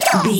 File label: goblin fairy hurt pain